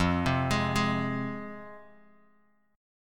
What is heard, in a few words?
F Minor F down Bass Standard; Fm arpeggiated